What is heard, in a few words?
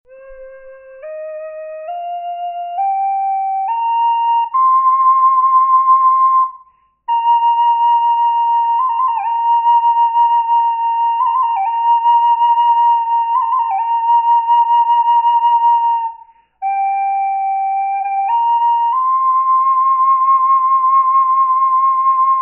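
reverb, flute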